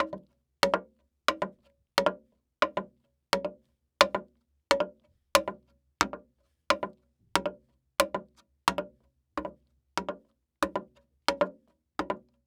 Foley Footstep High Heels Walking On Wood Boardwalk; Foley Footsteps